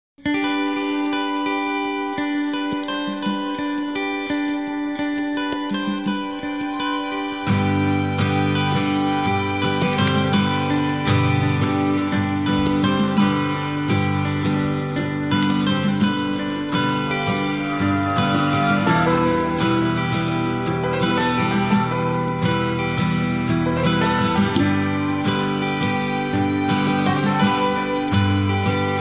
awesome guitar melody; Electronica

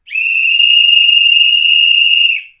whistle blowing

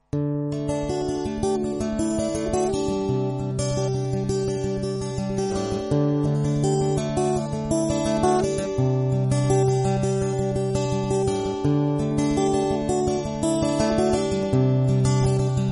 best acoustic ringtone

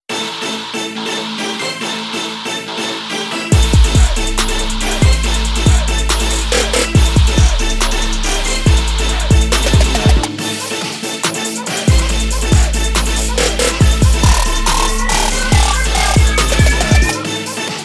trap remix; Mortal Kombat

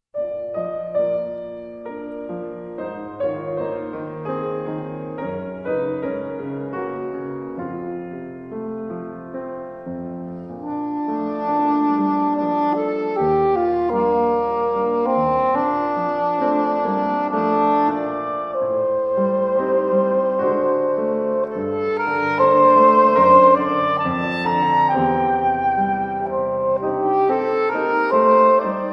piano

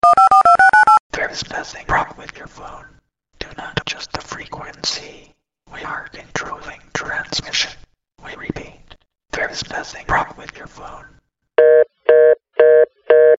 halloween phone, ringtone

